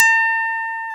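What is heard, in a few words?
Sup Rhodes G4, Piano Rhodes; Keyboards of The 60's and 70's; Classic Rhds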